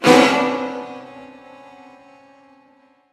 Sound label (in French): violon screamer